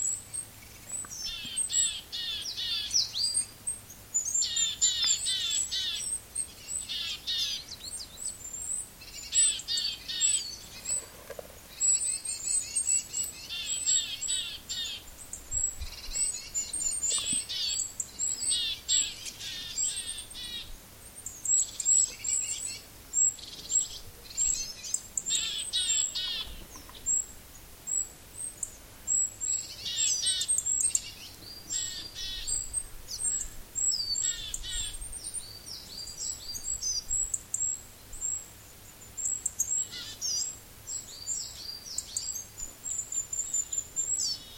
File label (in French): Ronde de mésanges col de Gleize